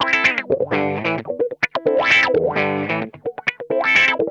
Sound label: ROCKIN, Funk Guitar; 90 s Sample CDs; Zero G